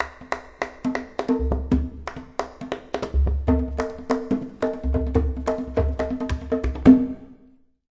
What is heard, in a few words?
epic congas, drum loops and breaks